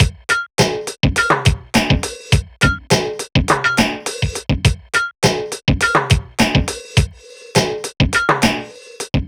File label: Beats